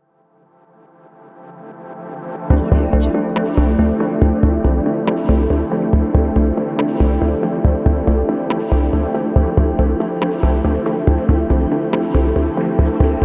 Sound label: deep ambient background